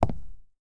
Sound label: Footsteps Concrete Shoes; Oneshot Footsteps